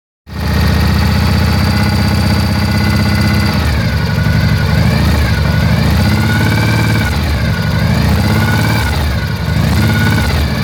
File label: chirping noise